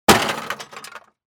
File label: Impact Metal Smash